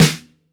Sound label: snare, dry standard snare